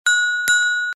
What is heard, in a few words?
notification